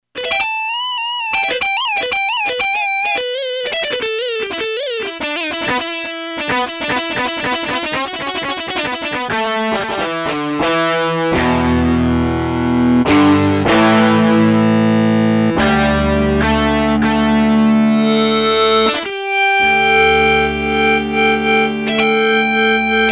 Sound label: Elec Guitar